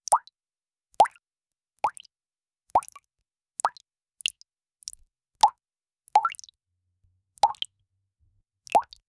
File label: lonely bloops of water